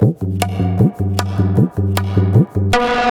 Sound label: tabla, 155 bpm; Zero-G; Pure Tabla